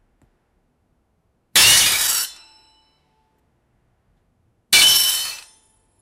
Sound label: smashing mirror